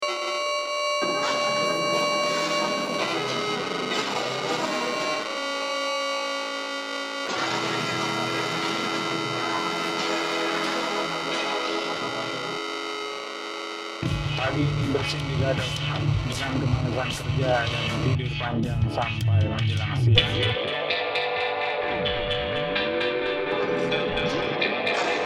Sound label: Whispers; Jakarta